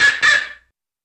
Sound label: Single Car Horn Honk; Various Single Car Horn Honks, Beeps, Chirps, Etc..